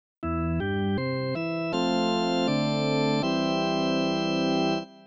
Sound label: Church; DQIV iOS